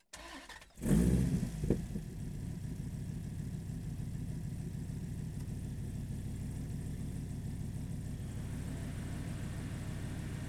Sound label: Dodge Viper GTS, Onbrd, Start, Slow, Drive, Stop, Reverse, Stop, Drive, Stop, Off, Engine, Right; 2014; DPA4061; Pole Position